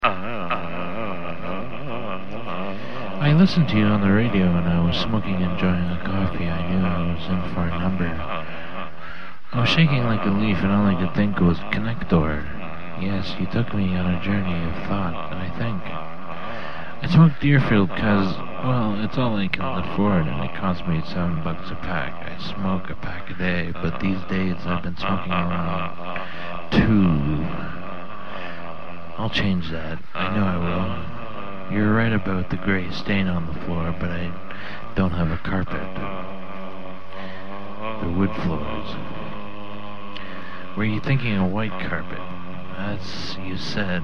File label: Weird Music